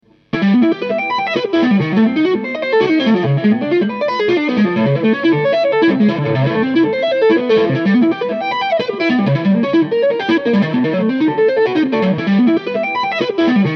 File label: prelude guitar solo